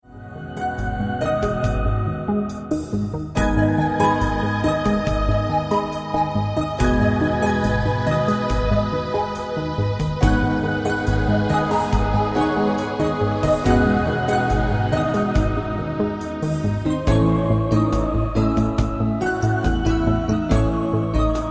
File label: urban trance